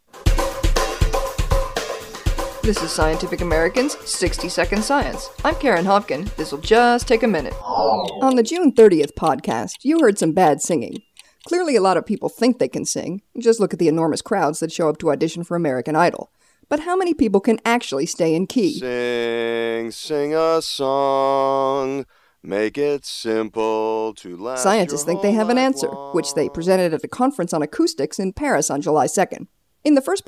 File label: singing; SCIAM 2008